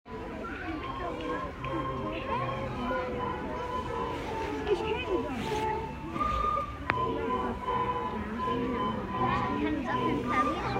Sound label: Jingle bells; Weihnachtssingen unter freiem Himmel